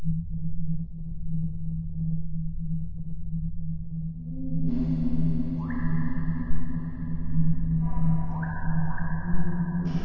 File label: Hum Sewer; mr future ninja